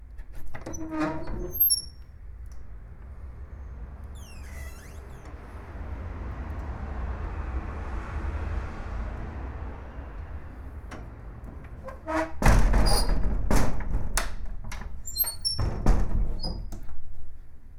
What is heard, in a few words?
Balcony